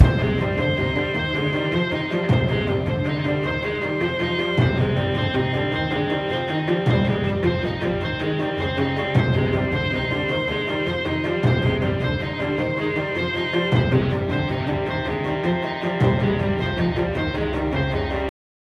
High Action Loop 105bpm 8bar; project zomboid

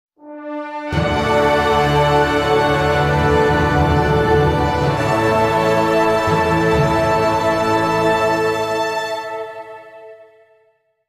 Win Battle; Heroes of Might & Magic V; Haven

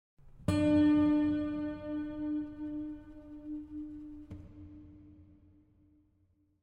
Chime; chime sharp

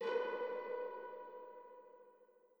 chime; chime sharp

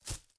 Footsteps Leaf; Main Character

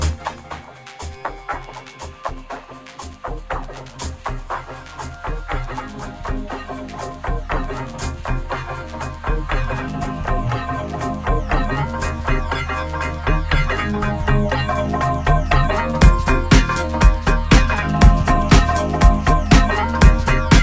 Music; Puddle

